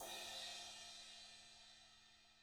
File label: BRUSH CYM; ILIO; Double Platinum Drums 1